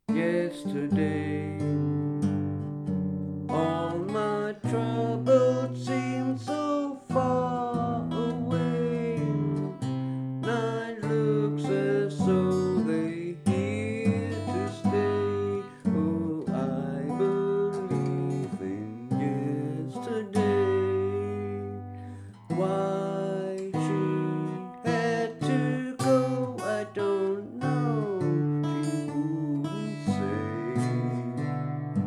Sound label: working tape vocal take